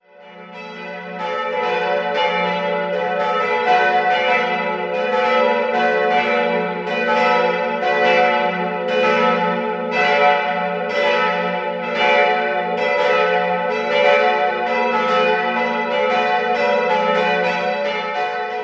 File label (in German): Oberstimm, Pfarrkirche St. Bartholomäus, glocken; 3-stimmiges Geläut: fis'-a'-h'; Die Glocken wurden im Jahr 1950 von Karl Czudnochowsky in Erding gegossen.